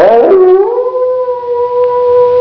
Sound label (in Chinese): 狼; 动物; 兽类; 特酷声音素材库